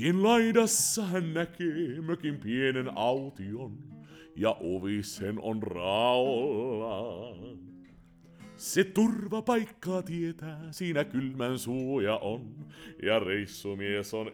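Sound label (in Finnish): AKG 3000 test new vocals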